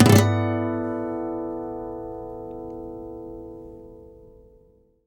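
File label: Nylon Guitar harmonics; E-MU Producer Series Vol. 8 – Platinum Phatt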